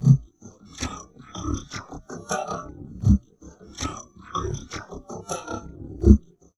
VOCOD, Drum Loops Crazy Processed; USB Soundscan vol.07; AKAI

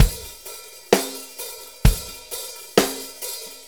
4-4 Loop, Drum Loops, Full Kit Loops; Drum Tools

